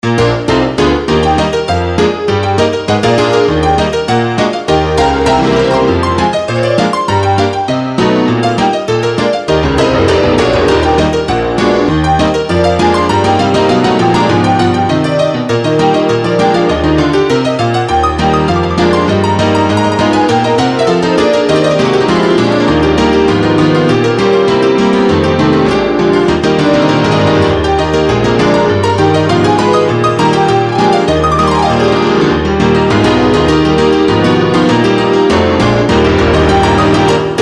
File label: Piano Song without Smash; Manamon 2; Sangora's Piano Song